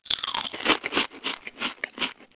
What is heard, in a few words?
crunch